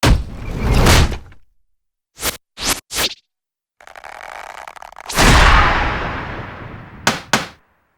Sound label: transform